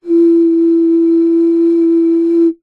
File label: foley blowing air over top of plastic bottle long; zapsplat